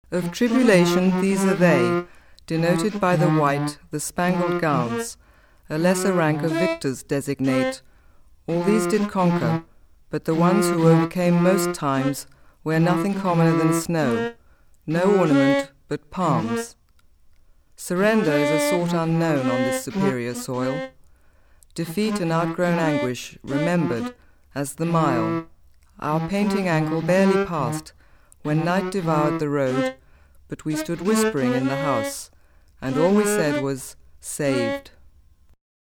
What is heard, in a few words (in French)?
voix flute